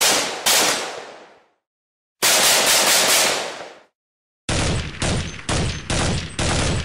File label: Carbine, ballistic